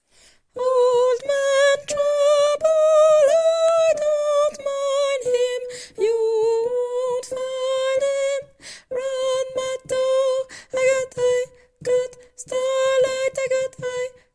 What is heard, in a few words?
tenor